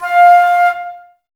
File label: flute, Wood Winds, Flutes; Miroslav Vitous - Woodwind Brass Ensemble